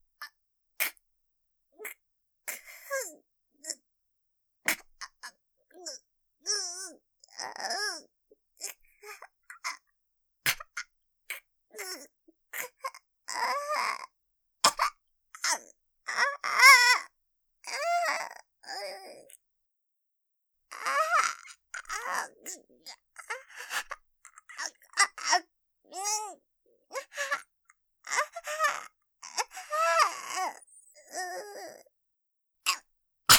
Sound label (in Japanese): 首絞め音声; べ、別に首絞めなんて; 首絞め音声のみver